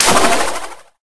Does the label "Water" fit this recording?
Yes